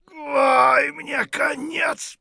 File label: hurt near death; Escape from Tarkov